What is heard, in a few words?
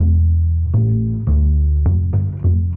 bass